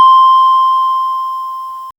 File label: chime tone c